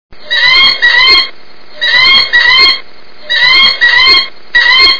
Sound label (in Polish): sokół wędrowny, głosy